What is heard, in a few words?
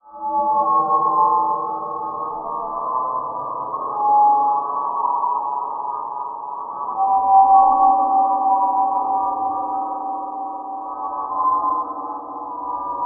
lost in space, Textures